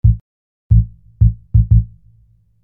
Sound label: Sine Bass